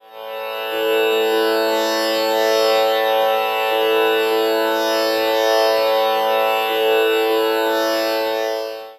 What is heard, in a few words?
7th up by 6db